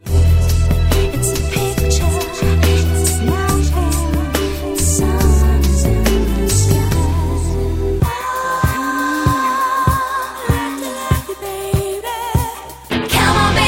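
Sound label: English (UK) Singing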